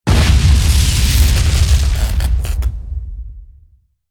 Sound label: Gravity Field Explosion